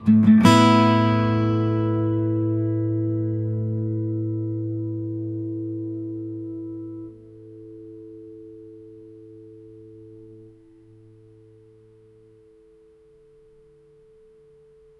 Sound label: guitar sample acoustic; instrument